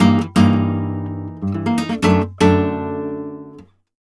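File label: arpeggio guitar, Flamenco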